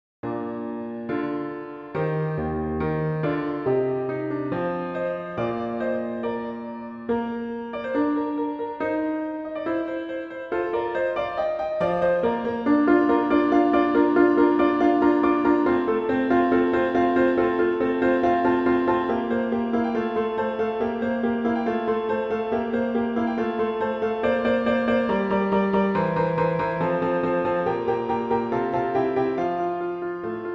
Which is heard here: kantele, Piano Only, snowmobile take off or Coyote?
Piano Only